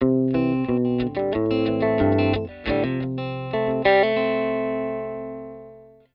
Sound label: STRAT POS 3 LICK, Middle pickup through a 1950 Fender Deluxe